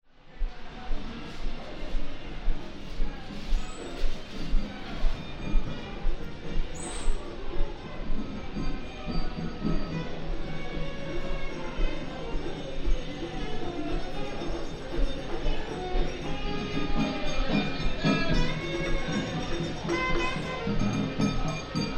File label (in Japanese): chindon